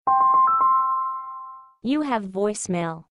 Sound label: Voic Mail